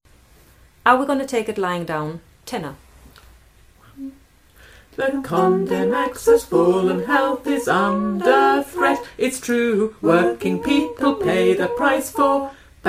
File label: tenor